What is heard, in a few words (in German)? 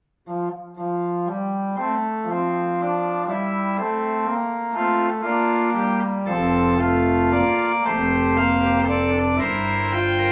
Lied Vorspiel